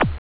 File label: twee; ST-XX Amiga Soundtracker Sample Packs